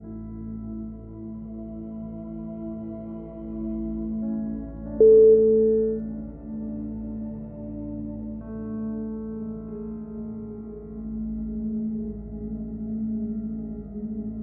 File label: peaceful piano background